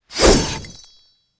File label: Wind atk; WindLence